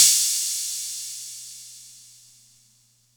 Atlantex Music Percussion Computer Cymbal Long, drum machine